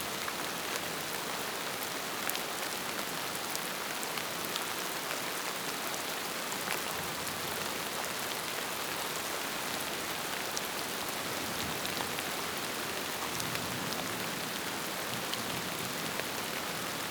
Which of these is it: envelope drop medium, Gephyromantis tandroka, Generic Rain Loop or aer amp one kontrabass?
Generic Rain Loop